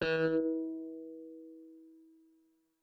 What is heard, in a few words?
GUITAR ECHO